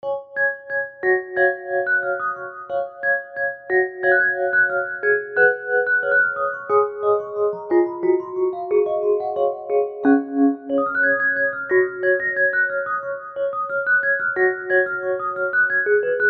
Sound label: glockenspiel; dowland puffe